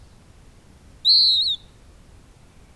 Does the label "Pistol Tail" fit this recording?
No